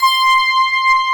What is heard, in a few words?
EPF1 C6; AKAI S-Series CD-ROM Sound Library VOL-2; KANE